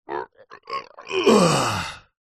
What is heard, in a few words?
male voice groan relief after lifting heavy weight; zapsplat